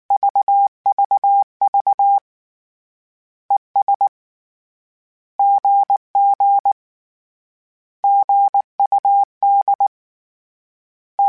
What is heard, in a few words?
Words, Morse Code; Mixed; HamRadio